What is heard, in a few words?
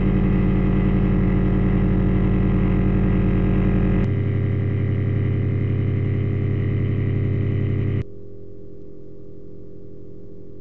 structure borne air borne sound absorbing